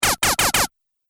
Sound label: Quad Laser Shots; Laser Gun Sound Effects; Sci-Fi Laser Sound Effect